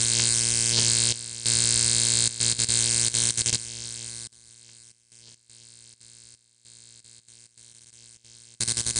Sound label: loop neonlight buzz; DoomRTX; lights